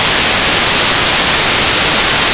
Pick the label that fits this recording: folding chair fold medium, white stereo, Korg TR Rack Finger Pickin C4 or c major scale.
white stereo